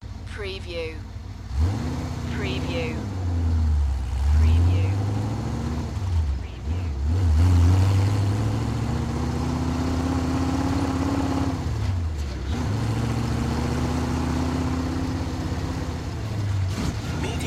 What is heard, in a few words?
Chevy V8 Hot Rod Long Sound Engine Slow Driving